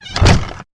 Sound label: door closing; SoundsCrate